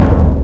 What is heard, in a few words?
drum; David Beckham Soccer; XBOX